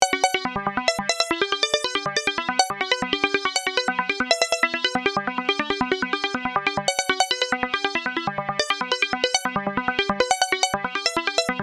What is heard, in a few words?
Reverb Plug Sound